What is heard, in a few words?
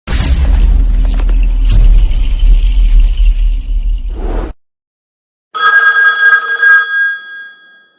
ringtone; squid game